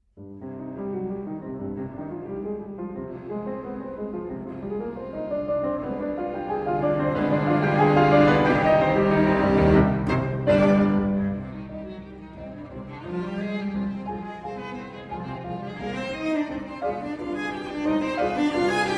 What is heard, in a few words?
Trio in C Minor, Allegro Energico e Confuoco